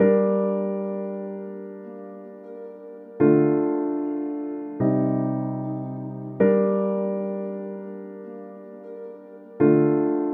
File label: dramatic drum comp no arp no violin; By Burak Sound Bank; dramatic dram vol 15